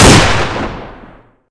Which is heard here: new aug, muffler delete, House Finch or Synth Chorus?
new aug